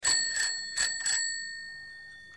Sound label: bell